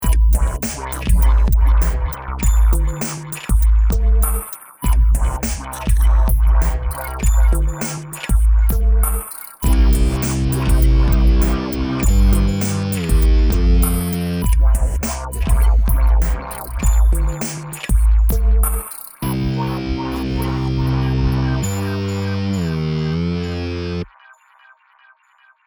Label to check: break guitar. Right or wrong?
Right